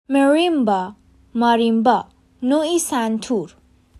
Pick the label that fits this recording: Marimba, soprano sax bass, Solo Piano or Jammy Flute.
Marimba